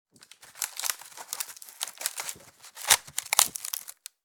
Wicker basket creaking

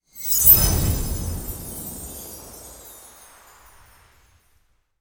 Magic poof sparkle whimsical; fairy

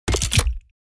Sci-fi weapon reload gun reloading

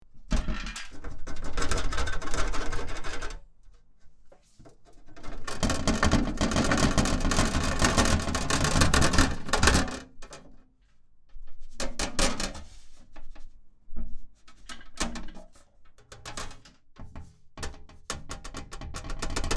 Metal rumbling rattling and vibrating designed

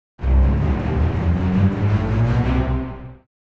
Cartoon orchestral scale up

